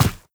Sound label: step run, footsteps, player, mud